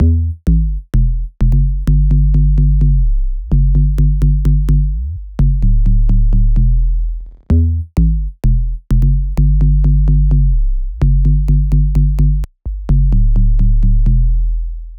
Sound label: 128 BPM E Min Bass; House; Drop Loops; Loop Stems; Cymatics; Volt